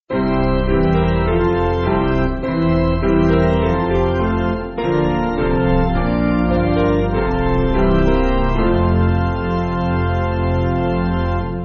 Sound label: Glory Honour Praise Piano; Madan